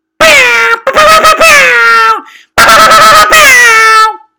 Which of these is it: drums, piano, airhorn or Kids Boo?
airhorn